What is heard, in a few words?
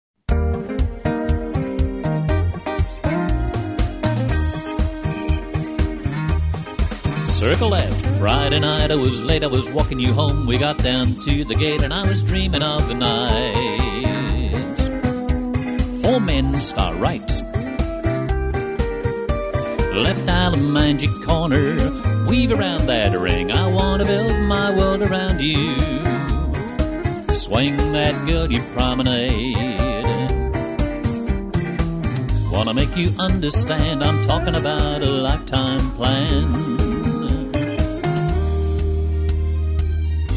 reminiscing vocal; square dance music